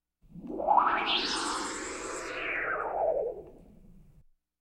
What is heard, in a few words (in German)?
Science-Fiction Pulsierender Frequenzton Auf Und Ab